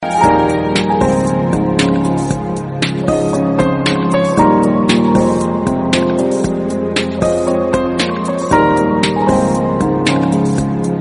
piano

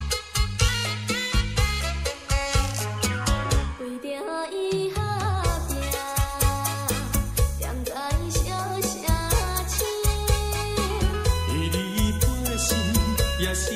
best music ringtone

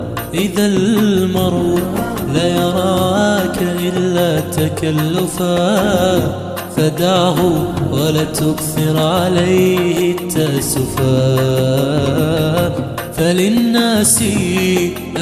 arabic music

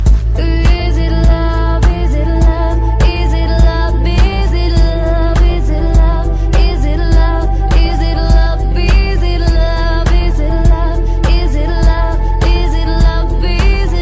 ringtone

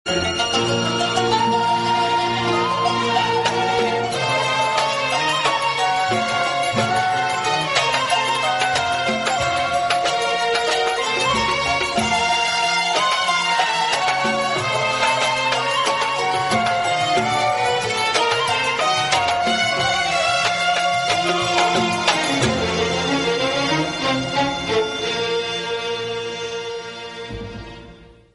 kuwaiti music

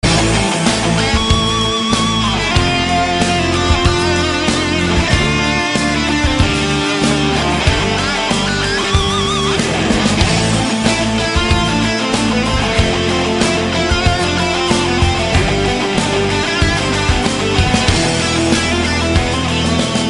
solos ringtone

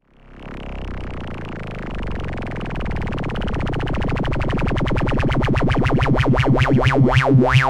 sms tone ringtone

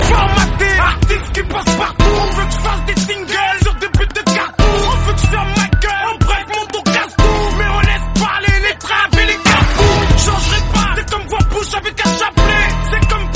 french hip hop

